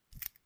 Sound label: Stop Edit Attachment Sound, Weapons; Game Kit Controller